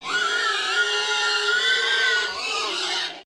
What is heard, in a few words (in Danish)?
Grissehyl; DYR